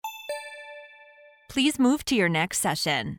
start meeting tone please move to your next session